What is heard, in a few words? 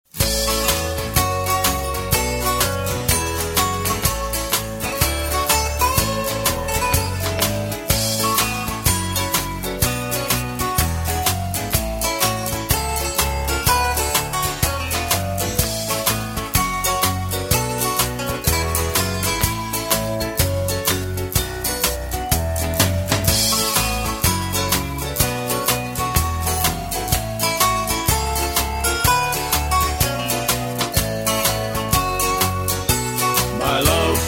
harmony